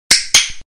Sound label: dog clicker; کلیکر سگ